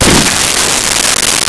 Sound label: warfare; Virtual dj sound effects and plugins